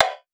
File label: Perc; Cymatics; Drum One Shots; Percussion